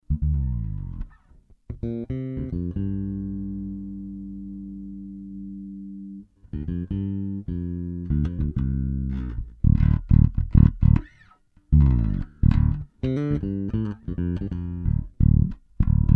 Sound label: sample ibanez obp3